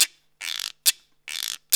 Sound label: Roots Reggae; Sounds Good - Roots Reggae